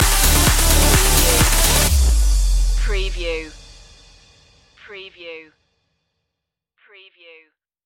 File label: club miami stinger